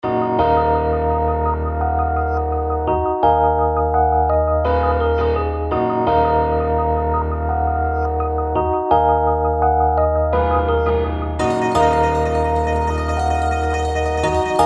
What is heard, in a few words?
D m 169 hard simple